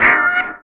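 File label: HORN, right; East West - Phat & Phunky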